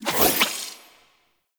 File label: Mote Pick Up; Tau; Tau Focus Ultimates